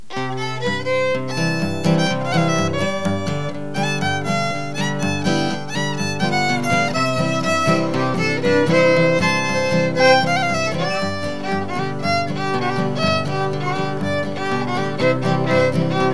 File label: Portuguese instrumental trio